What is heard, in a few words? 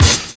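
MUTE CRASH; Univers Sons - Kitch 70s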